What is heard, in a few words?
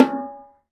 Bongo Low, Roland BK-5; Percussion